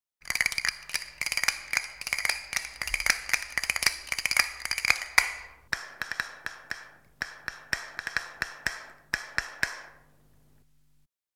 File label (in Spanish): Sonido de castanuelas; Instrumentos de percusion; Percusion indeterminada; madera